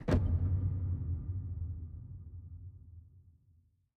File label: reel Bounce; rise of maya